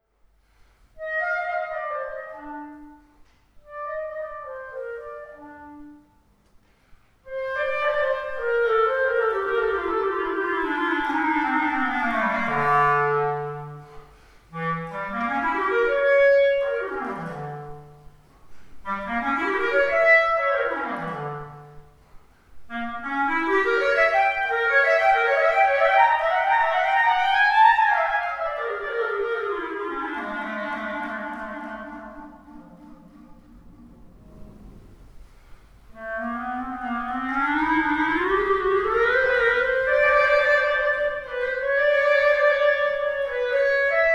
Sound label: cadenza, clarinet; Weiner cadenza (short)